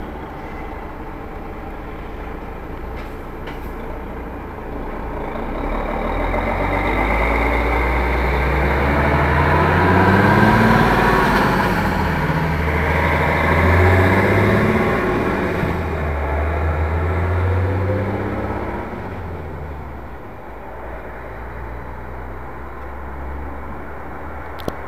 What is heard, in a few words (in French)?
Mercedes Actros (19 tonnes), camions